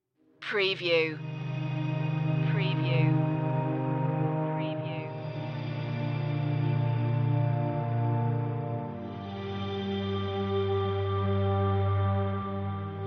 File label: tones no lead guitar